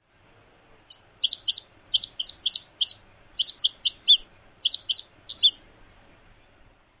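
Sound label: American Pipit flight song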